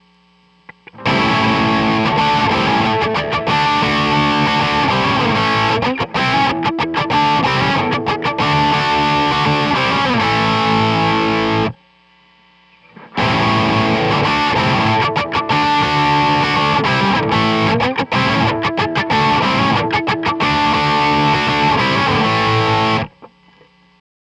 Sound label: dynamics test